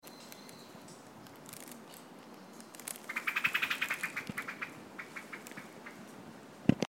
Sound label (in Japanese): tori sirohara